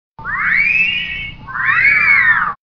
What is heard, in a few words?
Wolf whistle